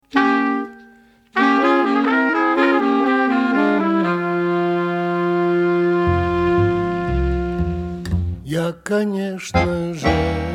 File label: OYOYOY, klezmer